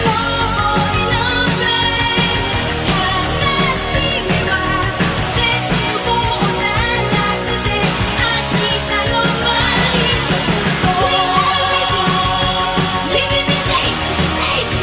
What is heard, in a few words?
ringtone